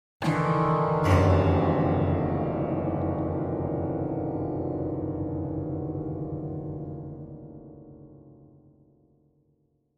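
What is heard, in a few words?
Grand Piano Strings; keyboards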